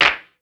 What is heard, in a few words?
CLAP, Hip Hop Misc Perc; E-MU Producer Series Vol. 8 – Platinum Phatt